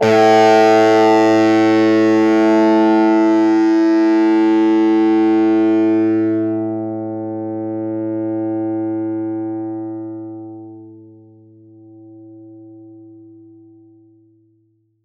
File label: PLK DIST A2, Acoustic and Electric, Plucked; Polyend; Simulacrum Sounds; 1 Shots